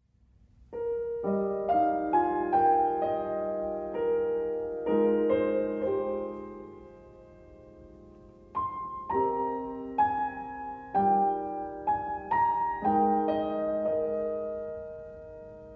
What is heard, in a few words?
Singing